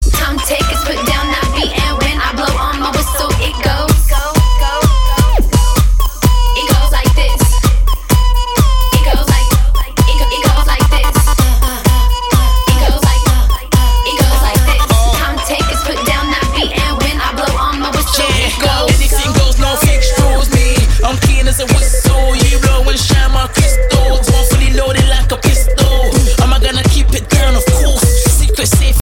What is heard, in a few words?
english song tone ringtone